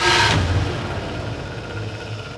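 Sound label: factory; Splashdown; XBOX; Soultree; Threeriv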